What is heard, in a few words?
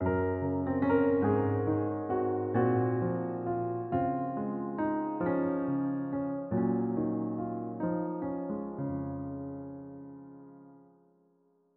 piano, march snow sound